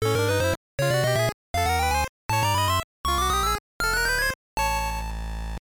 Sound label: Jingle; sanrio uranai party 1997 gb